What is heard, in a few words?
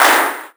SNARE, dubstep; DRUM HITS; Loopmasters; The New Sound Of Dubstep